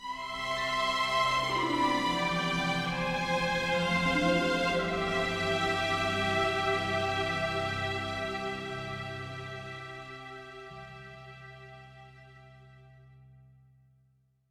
MUSIC STING ROMANTIC; HUB

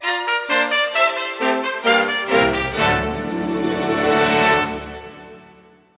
Metroid Victory Theme; super smash bros. ultimate expanded vol. 05 metroid; Brawl

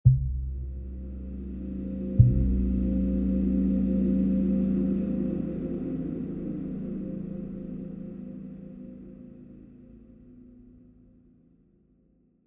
Tension; The Last of Us; AC Tension 6 Low Chunk

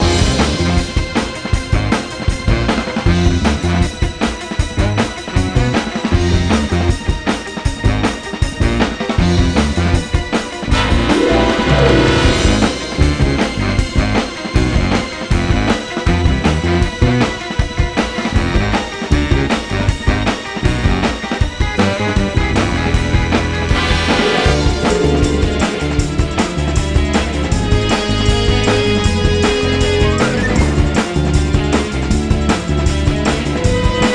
Bari Sax

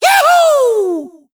Yahoo

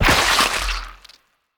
Bug Blow Up; We Are the Dwarves

